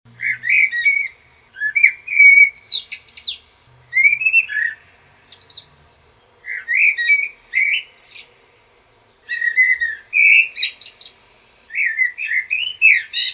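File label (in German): amsel, blackbird; nistkasten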